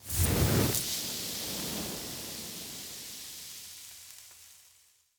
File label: Demon Burn